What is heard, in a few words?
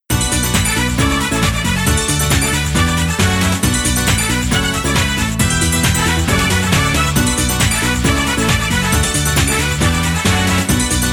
Karaoke Box BGM